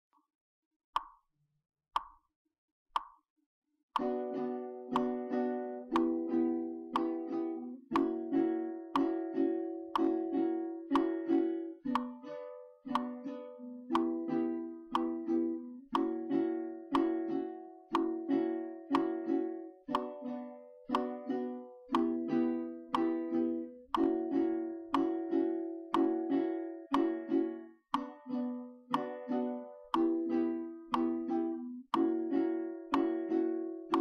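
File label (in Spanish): Triada Bm Em F#7 practica principiante metronomo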